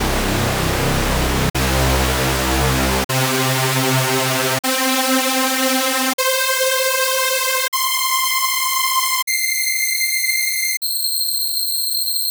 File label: noise saw